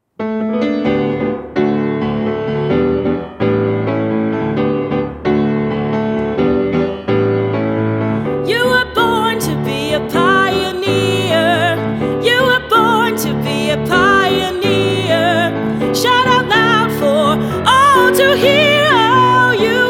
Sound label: Birthday Song